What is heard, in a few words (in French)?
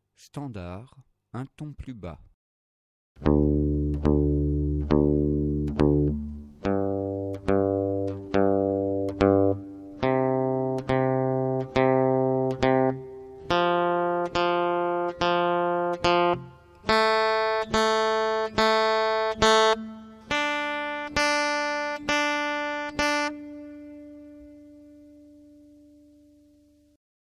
un ton plus bas